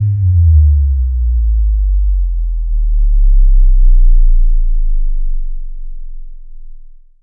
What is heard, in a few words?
Sub Kicks, Bassdrums